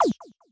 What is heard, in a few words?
Menu Close